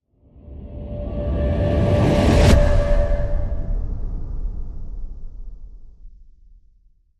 Hit Slow Grow

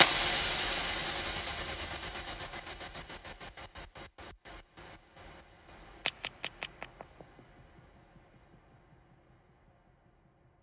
One Shot Match Strike

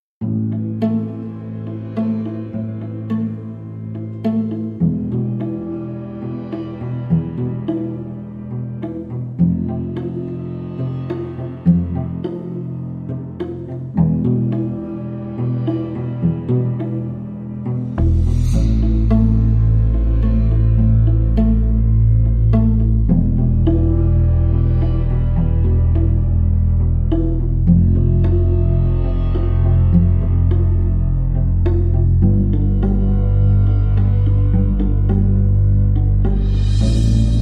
Only Violins Bass and Pads

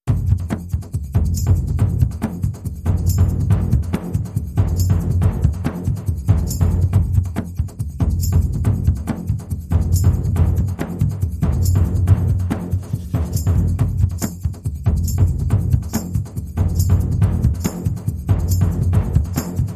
Groove Only

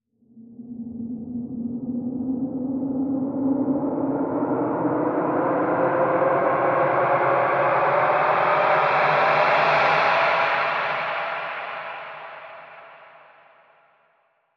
Arctic Rise; Quiet Avalanche

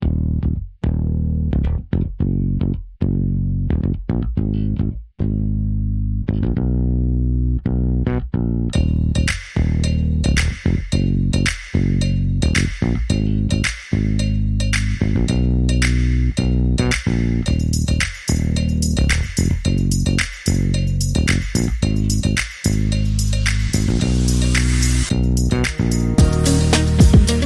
Funky

